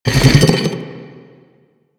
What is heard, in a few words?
arm drag; Warframe; Man In The Wall